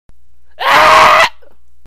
scream button

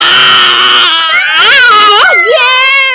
arrre

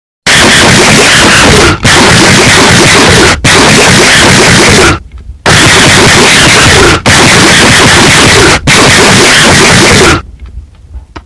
eating loud

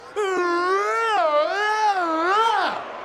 uueeoowahh

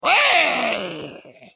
ghoul urgh

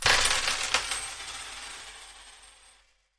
coins on desk

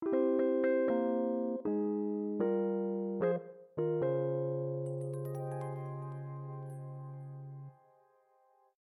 sleepy fanfare; ffxiv

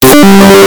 leave headphone warning; discord

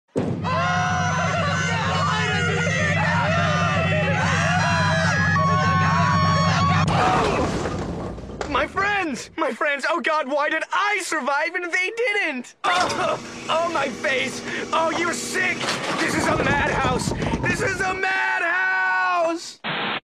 bowling pins

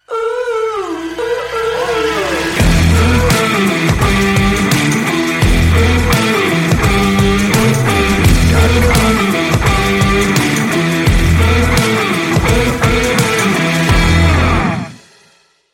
monster guitars

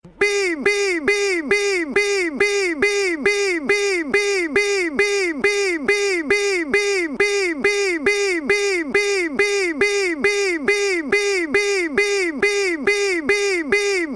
hardstyle

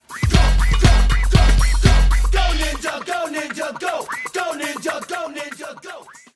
ninja rap

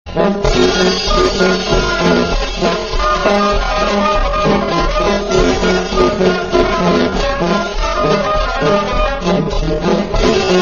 crazy march

